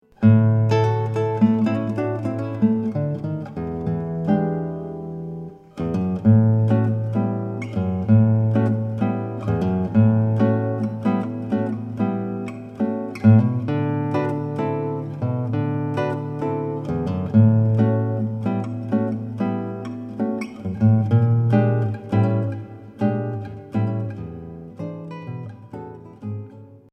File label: soft winds